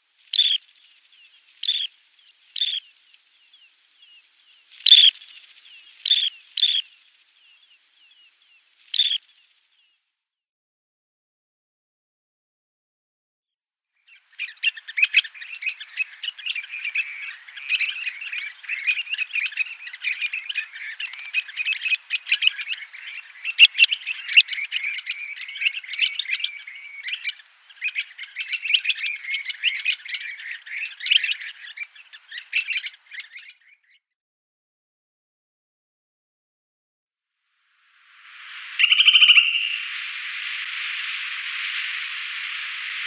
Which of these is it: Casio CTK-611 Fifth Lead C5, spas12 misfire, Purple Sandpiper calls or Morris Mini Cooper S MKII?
Purple Sandpiper calls